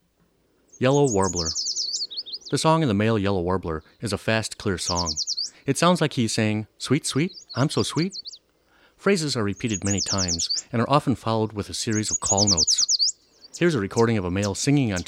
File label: Yellow Warbler